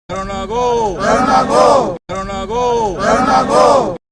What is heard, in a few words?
Corona Go SMS